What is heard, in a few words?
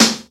crispy snare drum sound a key, Royality free steel snare drum sample tuned to the A note